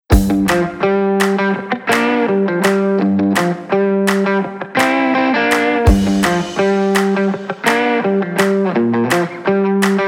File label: funky, ringtone; MIUI12